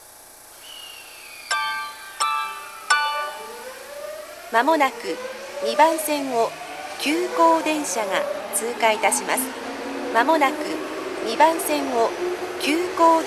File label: midorigaoka pass express, tokyu station